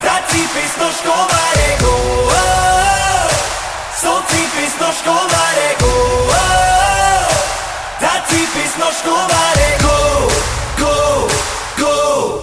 ringtone

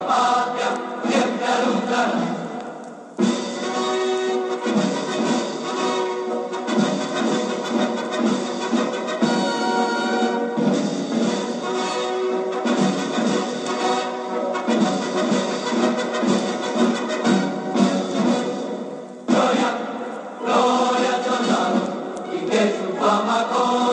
best colombian army ringtone

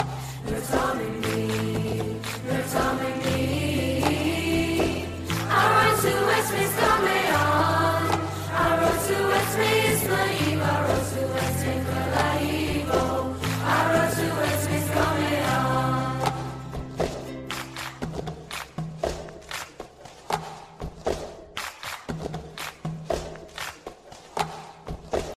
cup song; english

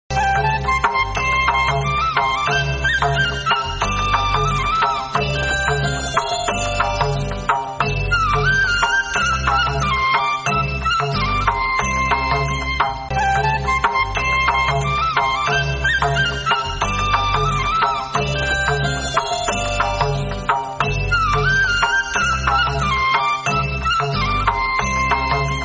rajamati flute

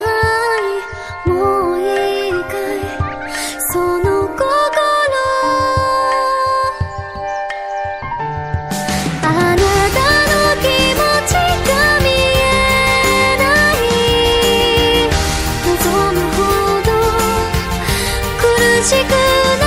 japanese nightcore ringtone

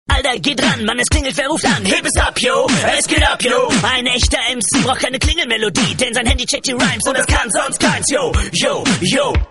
heb ab hip hop

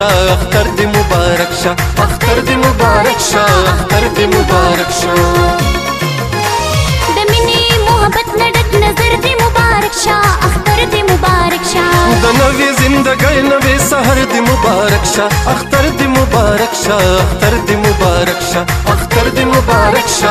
pushto, ringtone

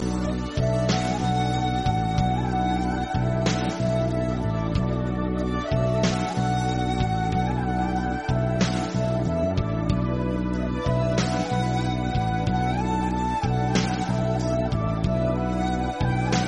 turkish flute, ringtone

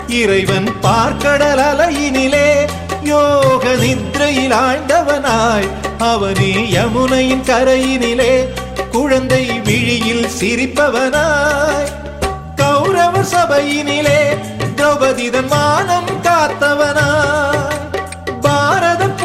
Tamil devotional ringtone

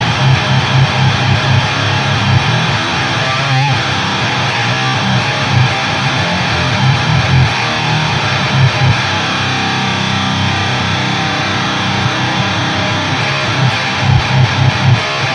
high volume swedish guitar ringtone

